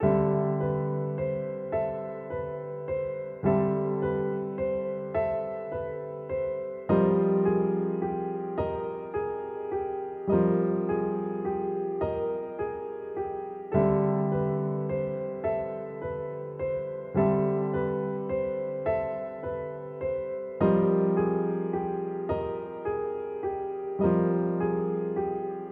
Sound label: piano